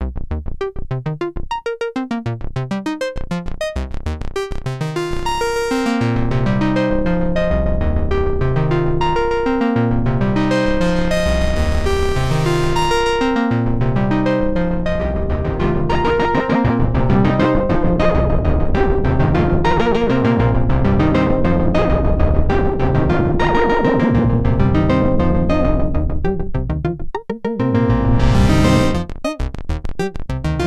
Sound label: Arturia MicroFreak Chopsticks Arp